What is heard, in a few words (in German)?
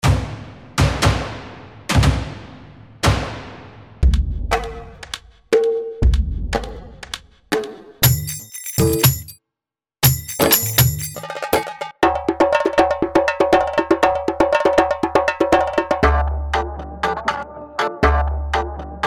Klangbeispiel PLP120